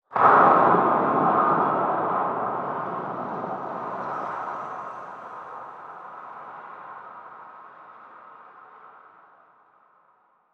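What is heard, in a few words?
mega shipyard metal ambience; Stellaris; federations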